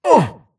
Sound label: grunt hits; Titanfall 2